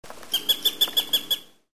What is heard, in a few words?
sparrowhawk